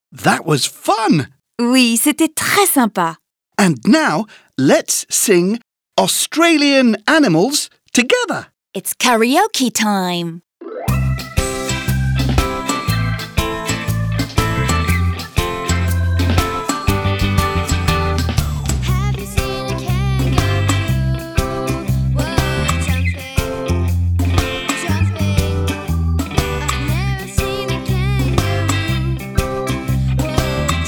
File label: Let's sing Australian animals song Karaoke; I Love English for Kids